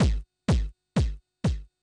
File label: DRUM SHOT HOUSE 125; eJay; Singleshots